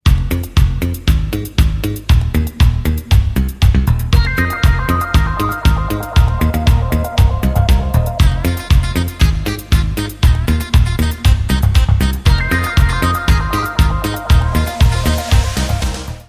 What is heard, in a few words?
ringtone disco, instrumental